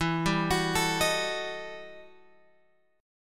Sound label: E Minor Major 11th E, guitar; {12 10 X 11 10 11} arpeggiated